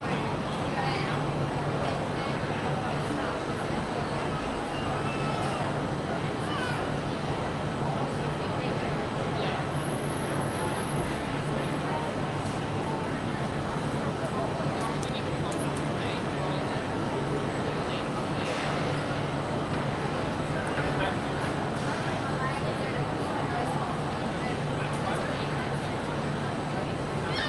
singapore ambiences market busy food court large crowd walla; telok ayer market lau pa sat; neon lights; fvtt sonniss gdc audio